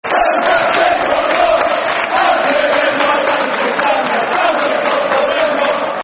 Who's That Team We Call The Boro, Football Chants; Middlesbrough; Middlesbrough Mfc

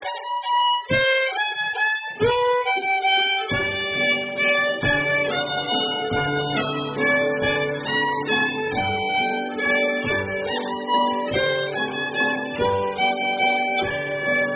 Waltz G; Hober

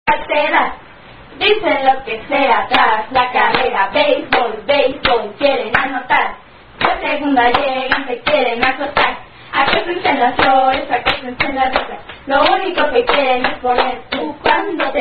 Spanish Version With Extra Rhymes

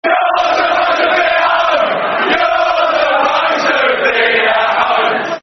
You're Supposed To Be At Home, Football Chant; West Ham WHFC

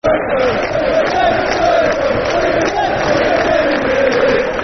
Super Kev, Football Chants (Soccer Songs); Bolton Wanderers Bwfc

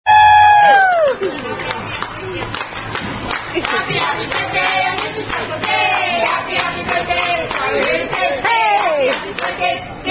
Happy Birthday [Happy Happy Birthday]

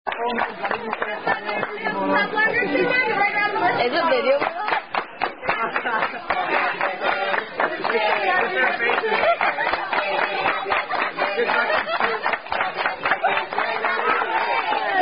Birthday Song, Happy Happy Birthday; Birthday Song Part Ii; Red Robin Happy Happy Birthday

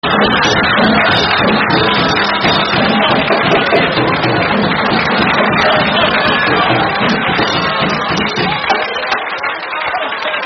Laughter From Preceeding Joke; [Laughter from preceding joke]

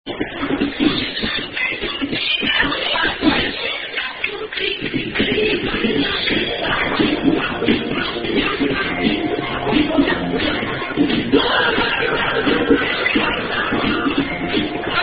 Hand Clapping With Music Playing

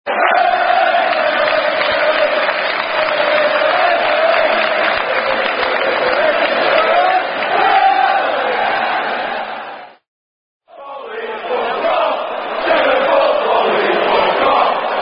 Various Football Chants; Leeds V Swansea

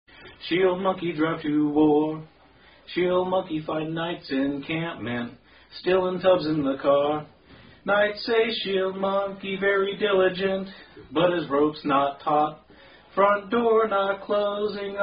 Song; Shield Monkey; SCA Song

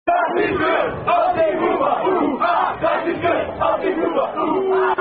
German Drinking Chant Game; Deutschland Erotic Game

